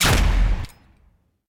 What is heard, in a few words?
cannon Fire